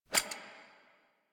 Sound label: Shotgun Reload; Warframe; Tenno; Lotus Pod Shotgun